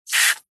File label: water jet spray from hose on flip flop cleaning short burst